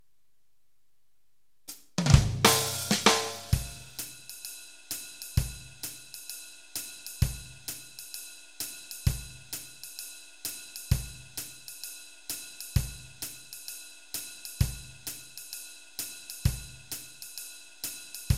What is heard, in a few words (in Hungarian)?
jazz kiseret alap, gitár